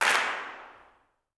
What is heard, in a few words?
CLAPS, Gospel; ILIO; Vocal Planet